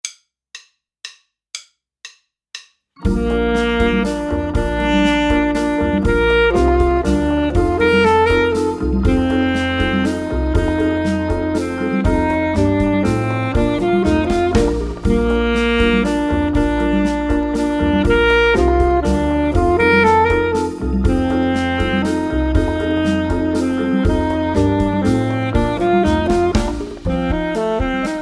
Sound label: Duo For Two Equal Saxophones Student Sax